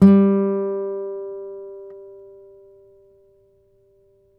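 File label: nylon, FLAMENCO, Guitars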